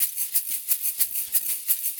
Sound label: SHK